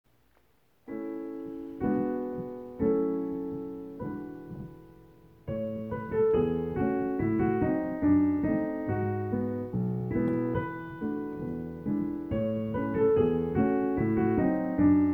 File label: soprano accompaniment